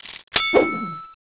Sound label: katana draw; deathrun